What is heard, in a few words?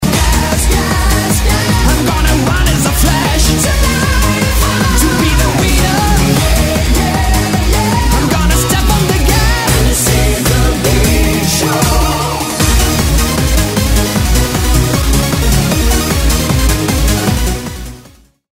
music